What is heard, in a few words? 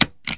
stapler; Weird and Interesting Hits; single hits